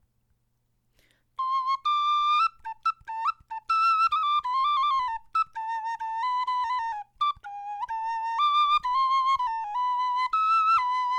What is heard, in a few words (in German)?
melodie, knochenfloeten